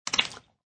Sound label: Splat; Splats; Objects